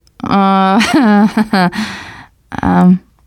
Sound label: laughter, teen girl